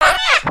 Beg, creatures pets

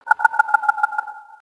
plains bird

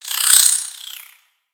Spider Dazed; Lego; creatures mechanical

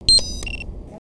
Racer Computer Idle; gnarled forest

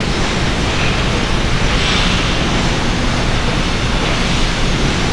Refinery Ambience

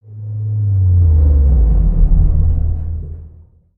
Pillar Collapse

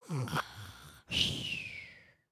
Sleep, creatures organic; Lego; Strombie

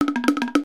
Wind Up; emotes

